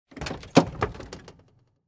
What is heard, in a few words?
car door open; Film Noir SoundPad